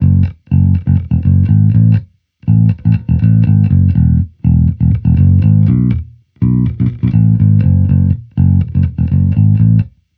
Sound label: Amped loop 122 E, Electric Bass Loops; Slate Digital BASSHOLE Sample Pack